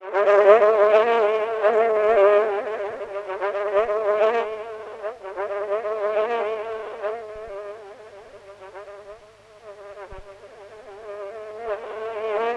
Fly Buzz Low Pitched

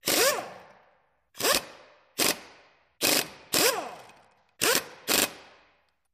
Wrench Pneumatic

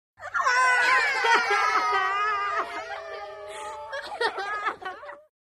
5 Kids Crying Short

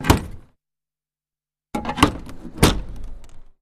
Carriage Door, carriages

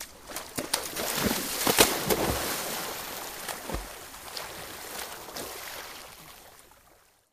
Person Run Diving, swimming

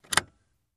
Dodge Van Switch Push; switches

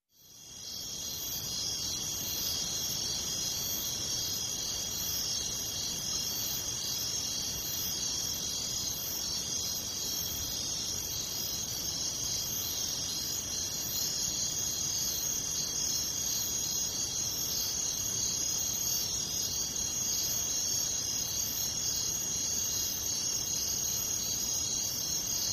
Night Ambience Larg, backgrounds

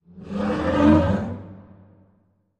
Animal Stinger; stingers & whooshes

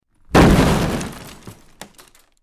Small Impact Medium E, metal & glass

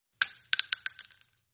Shell Case Sngle Tube, shell casing movement

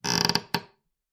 cabinet door screech; cabinets

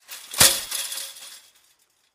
Chain Link Fence Gate Drop on Another Fence

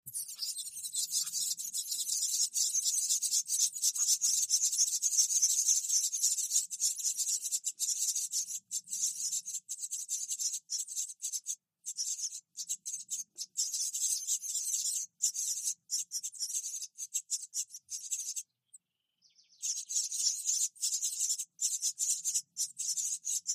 Baby Wrens Nest Peepi

